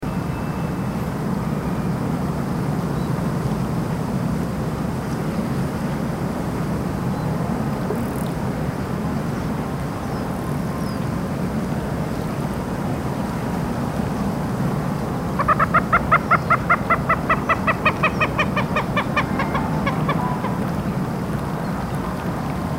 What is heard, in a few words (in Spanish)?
LAGUNA EN PARQUE ECOLÓGICO CAMPOSANTO SANTA ROSA DE LIMA PNP EN CHORRILLOS